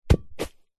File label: sport soccer ball flat light drop bounce grass; zapsplat